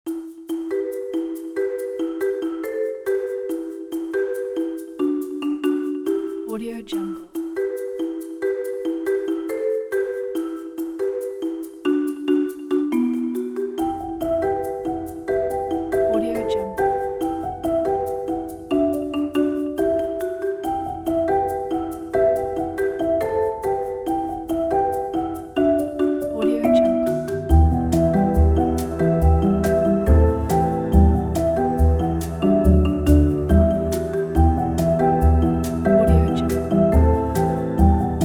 marimba mysterious documentary background

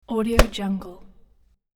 towel snap and impact

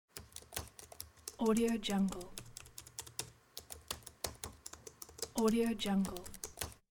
hard typing

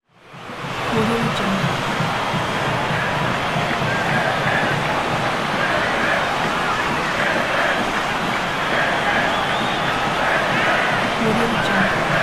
noise of crowd stadium

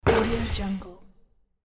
basketball rim shot

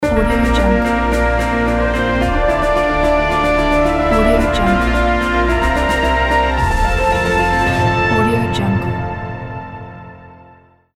intro epic logo

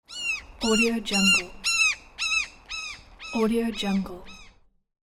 seagull

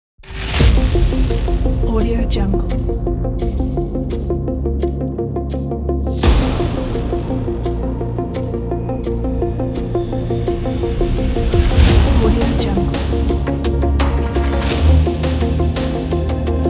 epic cyberpunk game stream

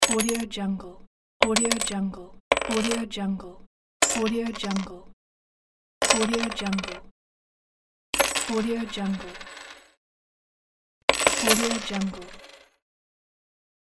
coins on wooden table

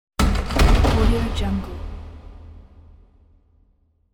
big stadium door closing

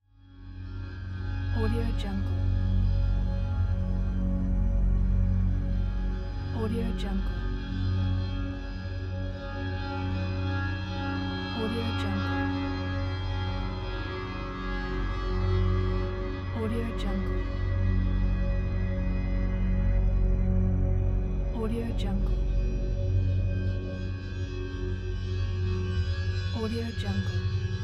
dark horror atmosphere

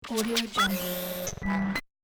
scifi loading switch long

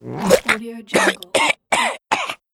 a vomiting

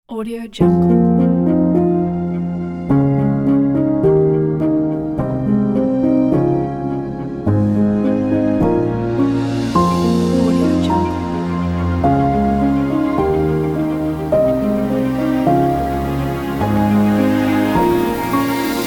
warm piano memories

